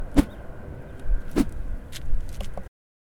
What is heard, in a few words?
Woosh